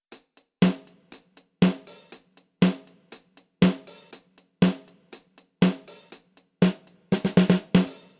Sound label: drums snare